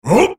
spellcast effort; Volibear

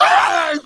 hunter pain, zombie; Left 4 Fortress

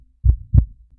slow beat

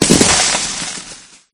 acid fadeout, acid spill trap; traps

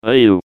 battle cry; John Madden

